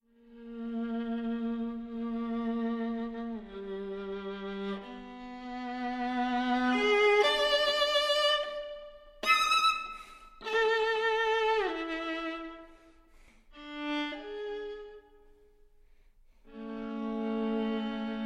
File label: no violin